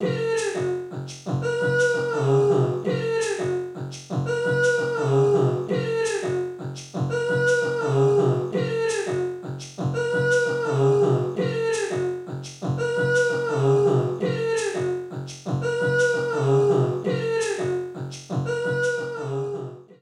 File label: Robot Running Adventure, music loops